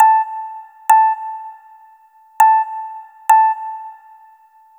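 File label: SYNTH, TIMBA, Hip-Hop & R&B; USB Soundscan vol.47; AKAI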